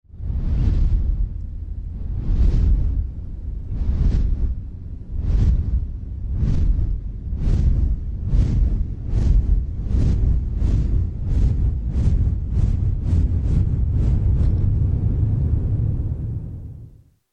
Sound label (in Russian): Polet drakona